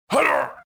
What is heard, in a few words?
grunt, pain death, male; Soldier Of Fortune II Double Helix; XBOX